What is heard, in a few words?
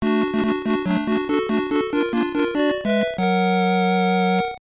Fanfare; Wizardry II Legacy of Llylgamyn; GBC